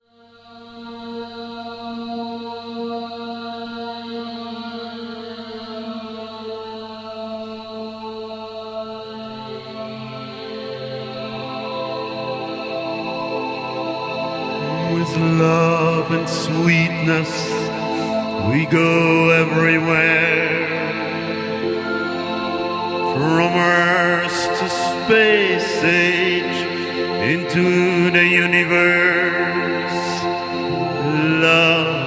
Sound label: Chant